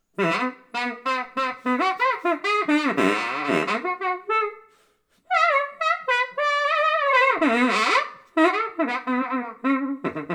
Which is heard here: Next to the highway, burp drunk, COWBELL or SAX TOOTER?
SAX TOOTER